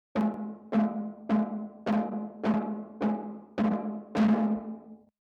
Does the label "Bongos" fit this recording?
Yes